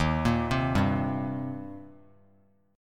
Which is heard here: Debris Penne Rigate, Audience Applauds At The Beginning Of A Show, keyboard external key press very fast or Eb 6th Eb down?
Eb 6th Eb down